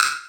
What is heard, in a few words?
Wood, Block Stick; Equipped Music; Loungin House